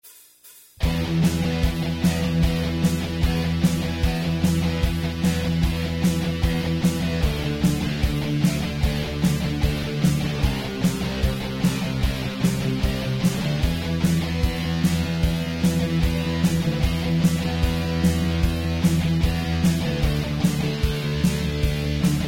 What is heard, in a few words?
5ths